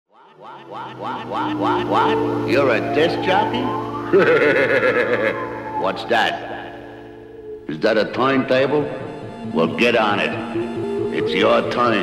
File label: Electro Gangsta